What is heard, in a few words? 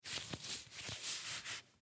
Belt Cloth Handle; Slides (Sound Effects:Objects); Blastwave; Foley